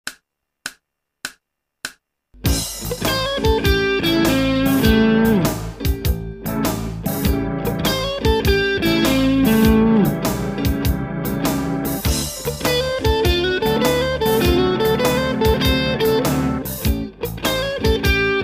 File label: Blues Etude